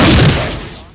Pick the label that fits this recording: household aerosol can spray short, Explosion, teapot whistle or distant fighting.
Explosion